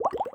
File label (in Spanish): Burbujas